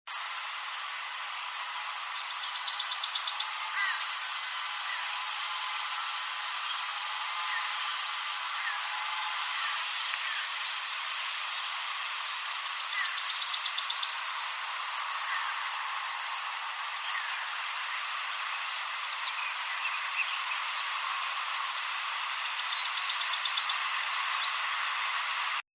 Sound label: Emberiza citrinella